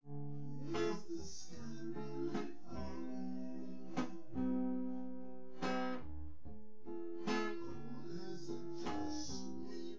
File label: nodrums